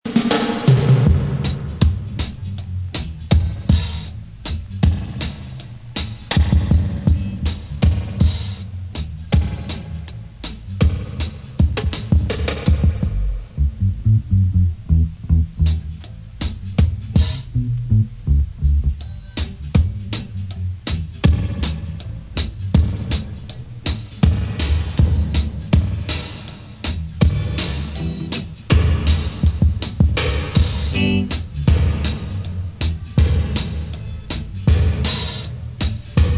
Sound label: dub